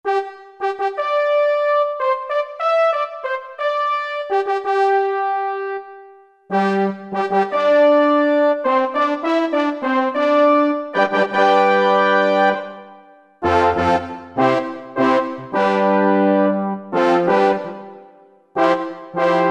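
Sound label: Brass Section; Roland SC-880; Factory Patches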